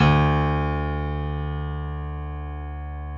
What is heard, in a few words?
PF, B GRAND PF